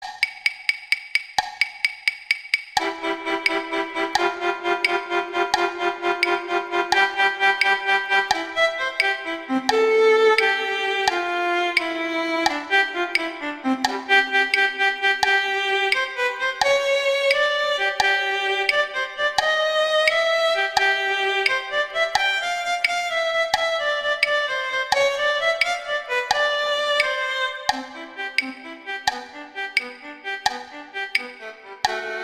Violin I; Allegretto; Duets; Mazas

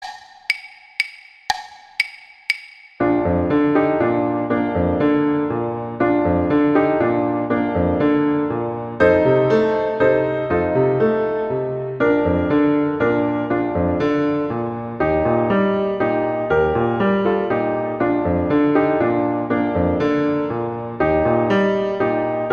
Silent Night acc; Gruber

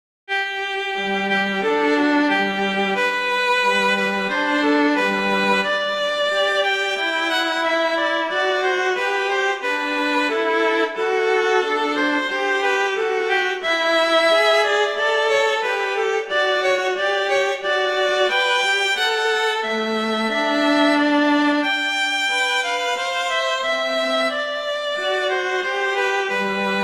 Duet; Mozart; Duets; Vla

